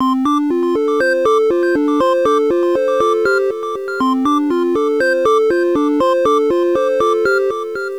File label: warm; ゆめ2っき